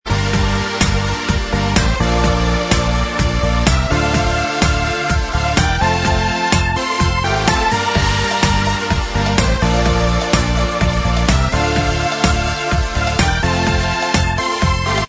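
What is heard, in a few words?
instrumental, backing track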